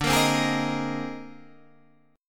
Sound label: C Minor 7th Sharp 5th D; Cm7#5/D strummed; JGuitar